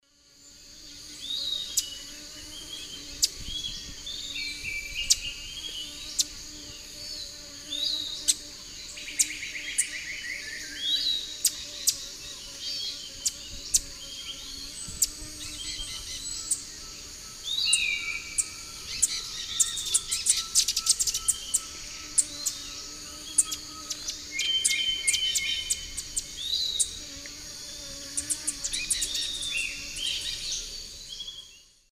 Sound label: Tangara nigrocincta, calls and song; S. America; Eastern Lowlands